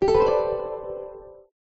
Combo; gardenbloom

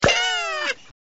exploder explode; gardenbloom

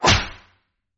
Slap

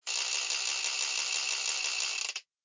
fortune; chicken

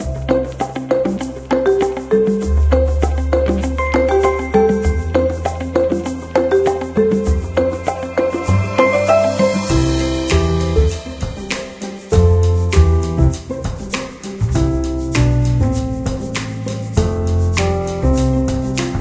main theme, tetris

